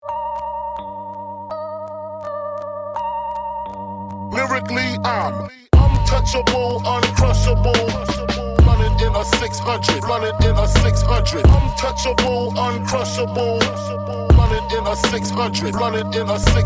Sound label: Instrumental